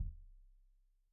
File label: Kik Stereo; Deeply Sampled Drums